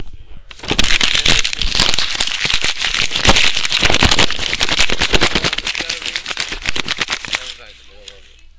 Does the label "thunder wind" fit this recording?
Yes